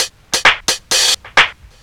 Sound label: hats, Deep House 130bpm